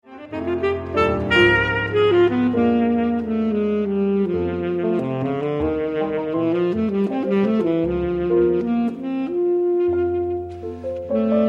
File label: Waltz